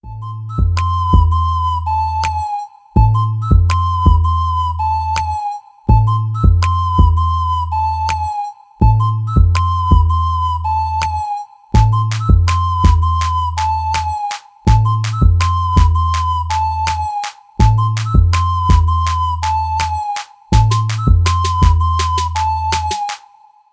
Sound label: wiggle instrumental